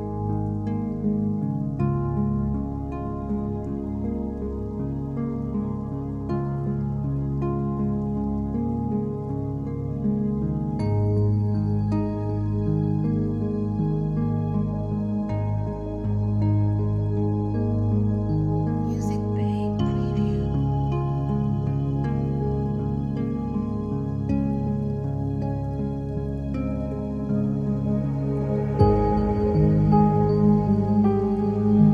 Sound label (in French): Musique douce; Repas des Anciens au Train Bleu